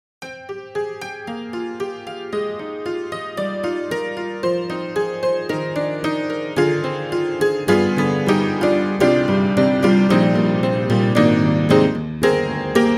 Upright Pianos Barroom Upright Barroom Brawl; Synthogy; Ivory II

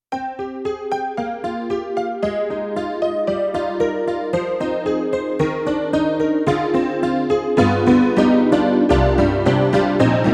YAMAHA MU90 Honky-tonk Piano

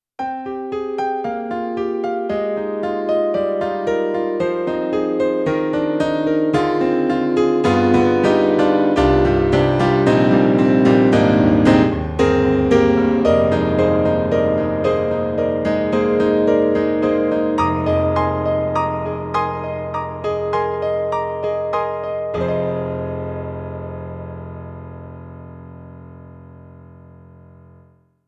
YAMAHA MU90 Ground Piano

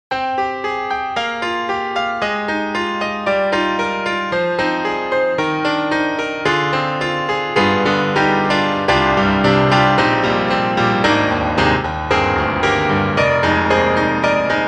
Micropiano Trance Piano; Cakewalk; Rapture Expansion Pack 2; RGC Trance Piano 2

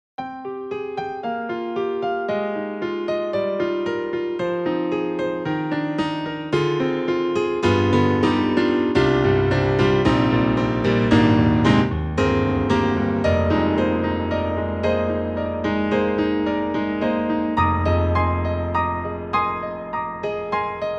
Piano Damper; KORG KRONOS 2

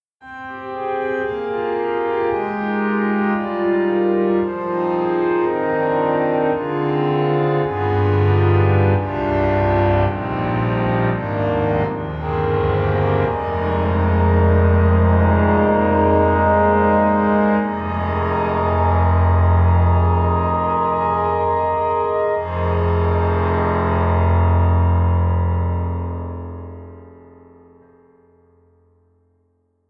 Pearl concert grand, reverse piano; IMPACT SOUNDWORKS

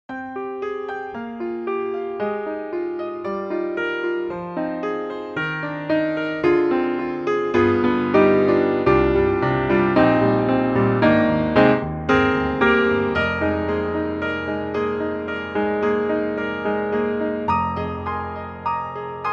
Cathedral; Soniccouture; The Hammersmith Professional Edition

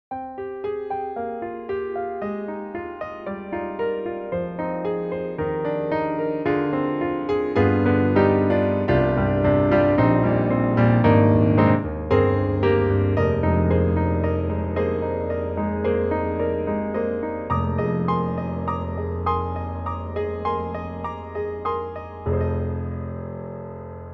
Imperial Grand Soft Grand; IK Multimedia; SampleTank3

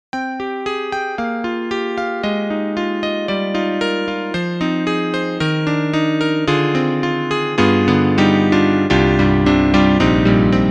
YAMAHA MOTIF-RACK XS CP 1979

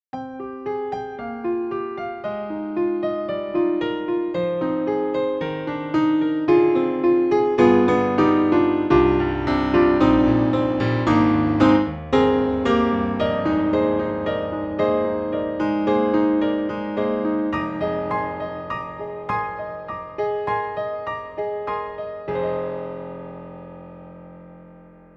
Italian Grand Jazz 10 Grand; Synthogy; Ivory II

